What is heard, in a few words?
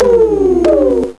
BOING